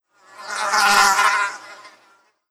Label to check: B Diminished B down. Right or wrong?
Wrong